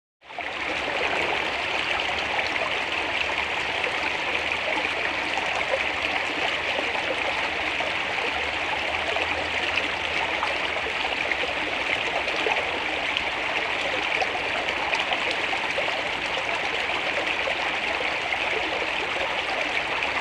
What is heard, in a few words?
Stream Small Medium - Fast & Babbling Over Rocks; water